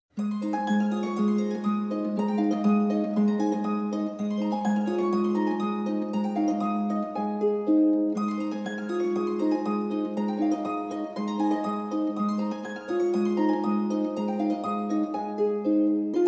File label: The Music Box, Harpworks